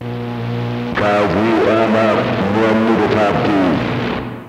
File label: Wall Detour Around Obstructions